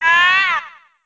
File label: mama penguin